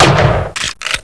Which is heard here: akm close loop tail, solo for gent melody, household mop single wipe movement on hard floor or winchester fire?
winchester fire